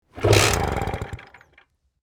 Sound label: Scooter ignition start pedal engine fail